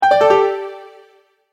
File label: Multimedia Tone-Return/Back